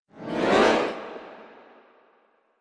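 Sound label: Grand Piano Cacophony; keyboards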